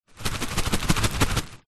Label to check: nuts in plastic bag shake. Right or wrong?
Right